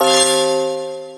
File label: bell